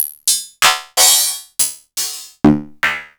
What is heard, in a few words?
Korg Electribe ER-1 Kit